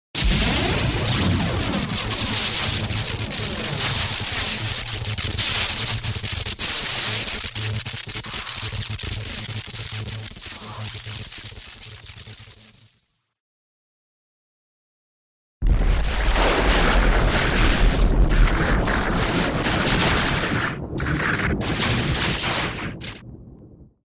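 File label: high voltage sound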